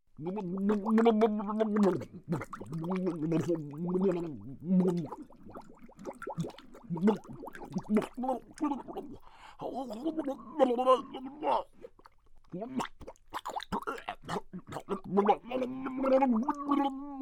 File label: Drowning, Scary Sounds; 1001 Sound Effects; Halloween Horror Sounds Effects